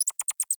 Night Creature Close; Ambience; Night One Offs

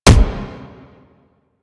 Explosion Punch Generic Small; Warframe; Weapons

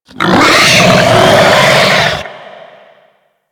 Infested Ancient Attack, Enemies; Warframe

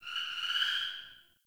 Cave Ocean Creature Close; Ambience; Midrath Island

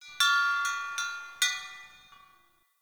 Chimes, Ambience; Midrath Island; Fey Hub

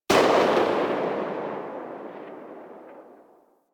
Sniper Rifle Tail; Warframe; Weapons; Corpus

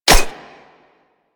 Alchemist Vial Ability Orb Spawn; Warframe; DE; Warframes

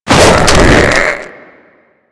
Infested Jetpack Charge Lift; Warframe

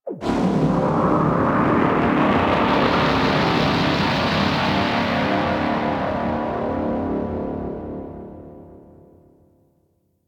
Exterior Deep Abyss Hit; Warframe; Rail Jack

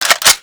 Cock, Weapons; Latron

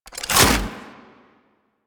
Gun Reload; Warframe; Thano Tech Arch Gun

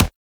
Weapon Punch Rifle; Warframe; Weapons; Rifles

